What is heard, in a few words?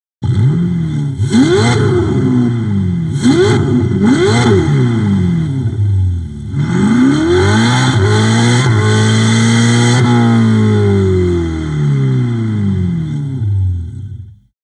ferrari